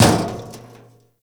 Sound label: Physics Barrel Impact Medium, Metal Barrel